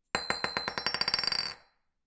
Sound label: Metal Bounce